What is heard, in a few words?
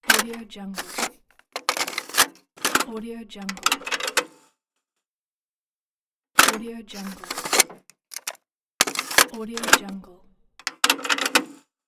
cassette deck insert play